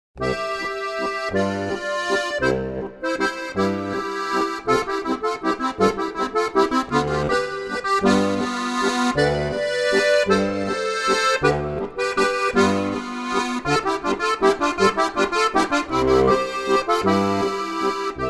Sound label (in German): walzer